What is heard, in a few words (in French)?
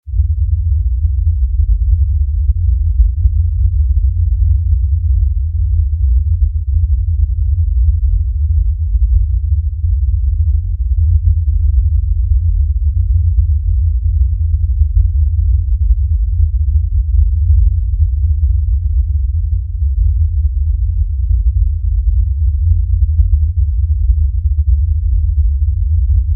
bruit rose